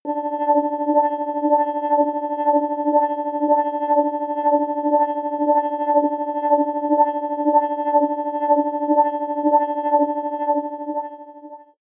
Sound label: sci-fi scanner